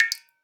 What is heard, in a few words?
Drips